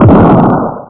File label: Xplo Shor